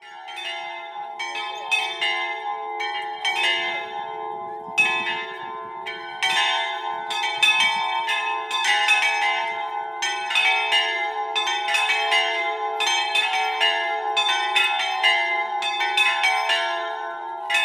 SAN BERNARDO, campane

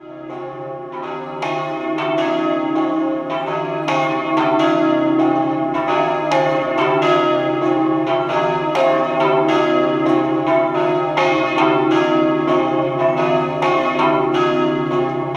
Caneva, campane

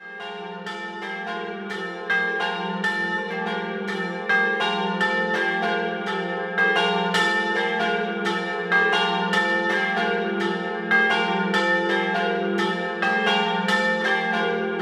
Budoia, campane

